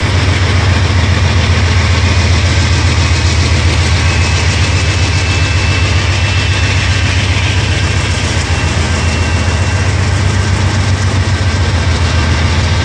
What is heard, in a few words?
Motor, Train; P42DC x2 11 Car Superliner Long Distance